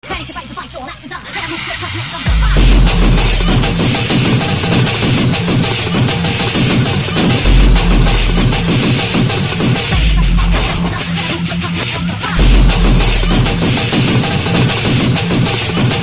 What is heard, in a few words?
artkore